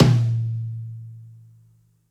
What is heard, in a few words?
tom one shot